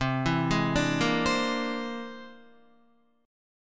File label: C Augmented 9th, Guitar; arpeggiated; JGuitar